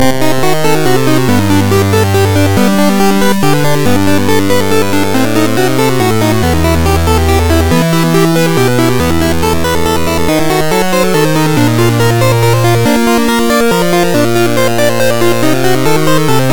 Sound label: ARP DIMINUITO